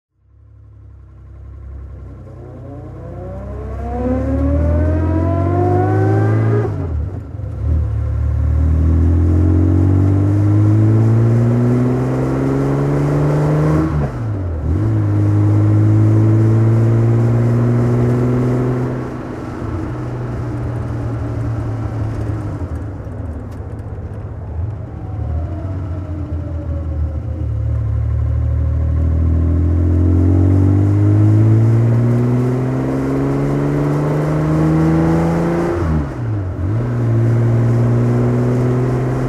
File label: aston martin db24 driving